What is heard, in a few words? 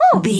voice fun small character emote surprised